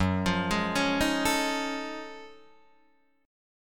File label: F sharp Minor Major 13th, Guitar DADGAD